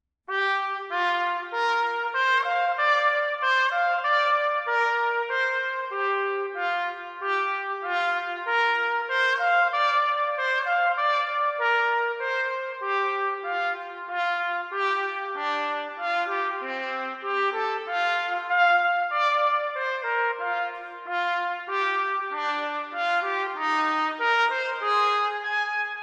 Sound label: Trumpet Audio